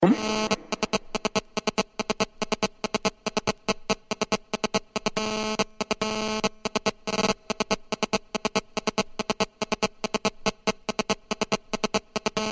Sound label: best sms tone ever